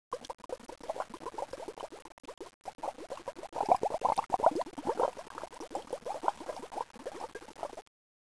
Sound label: BUBLES